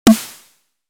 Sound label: snare tail